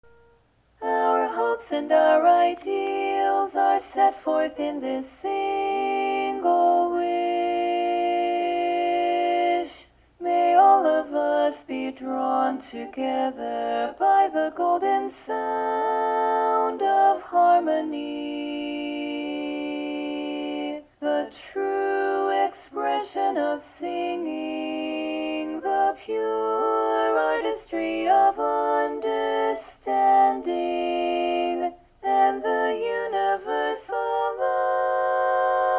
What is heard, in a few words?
Lead